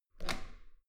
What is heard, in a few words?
metal door heavy handle down hard